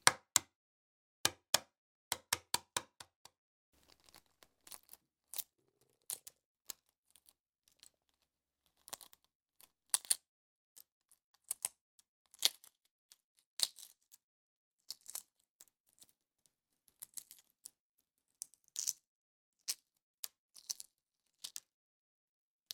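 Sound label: peeling an egg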